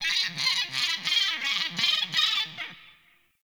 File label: Forest Bird Mid; Ambience